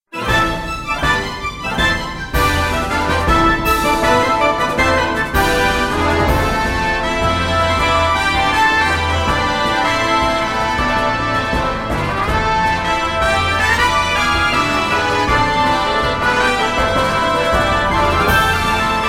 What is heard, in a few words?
battle